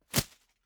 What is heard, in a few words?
whoosh paper scrap flutter hard